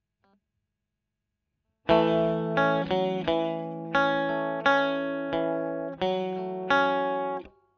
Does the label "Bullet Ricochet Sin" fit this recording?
No